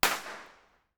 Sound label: creswell crags; b-format; pinhole